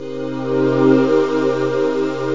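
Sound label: atmosphere sus4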